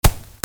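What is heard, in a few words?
percussion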